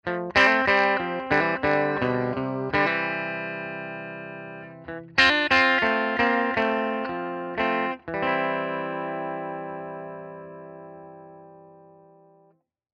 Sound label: Les Paul